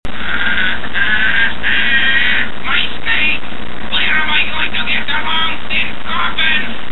snake

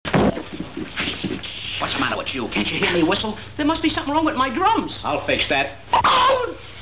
drums

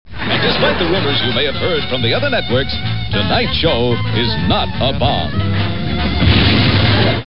bomb

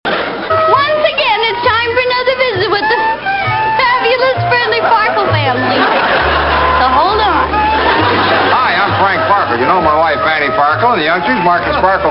laugh

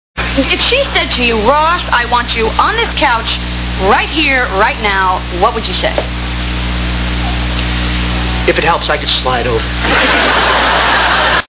slide

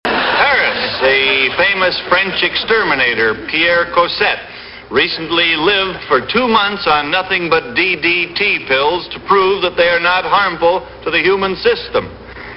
laugh

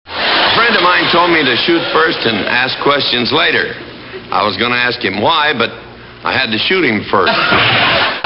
laugh

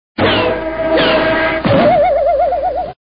2 hit bang

